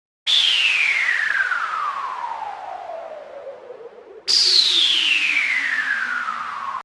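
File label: intro sound effect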